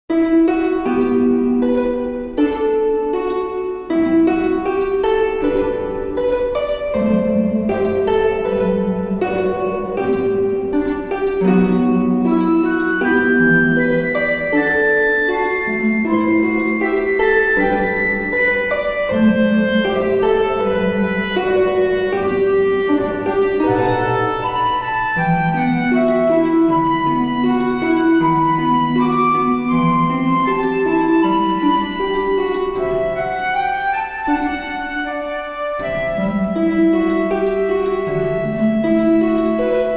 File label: crystal loop